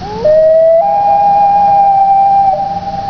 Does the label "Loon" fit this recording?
Yes